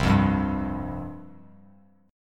C Suspended 4th, chord, Bass Standard; Csus4 strummed; 8,8,5,0; JGuitar

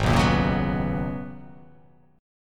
Ab Minor 11th, Ab; Abm11 strummed; JGuitar

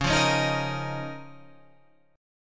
Db 6th Add 9th Db down Guitar Standard; Db6add9 strummed; JGuitar

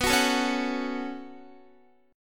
B 7th Suspended 2nd, Guitar; B7sus2; strummed

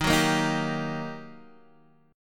G Minor Major 7th Sharp 5th Eb

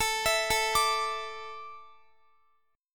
A Suspended 4th, Guitar; Asus4; arpeggiated; x,x,19,21,21,21

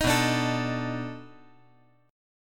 B 7th Sharp 9th, down

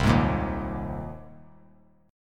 C Minor 7th Sharp 5th C; Cm7#5 strummed; JGuitar

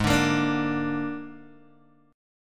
Gsharp Suspended 2nd Flat 5th, Guitar, Open D; 6,5,8,8,5,x

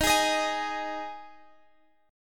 Ab 5th Eb

